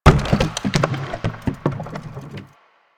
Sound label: Large Plastic Garbage Bin